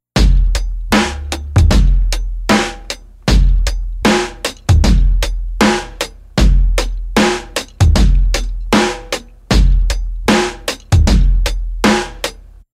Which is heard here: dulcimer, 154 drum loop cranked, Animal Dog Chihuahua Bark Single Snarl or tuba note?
154 drum loop cranked